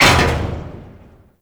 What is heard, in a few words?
Mech Head Impact; Nechro